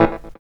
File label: guitar, C guitar